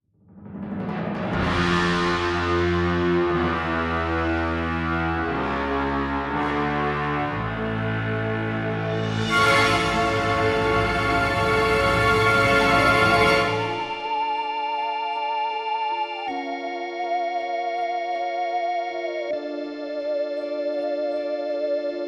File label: Horror, Scary; Hollywood Horror